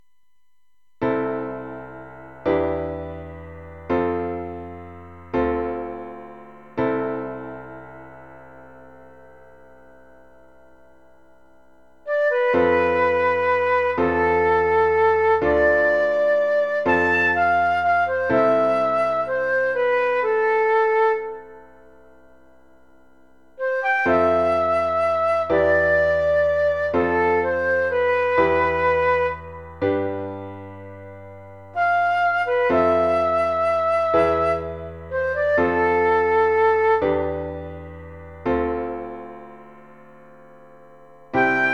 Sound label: slow waltz